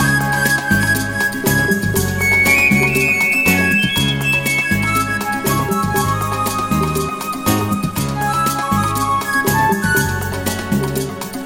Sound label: Guaguanco